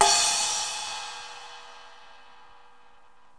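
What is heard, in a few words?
med hi cymbal; drums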